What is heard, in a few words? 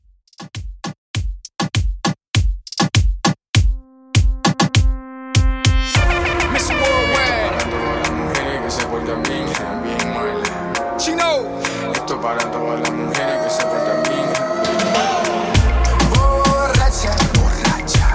Reggaeton Redrum 100Bpm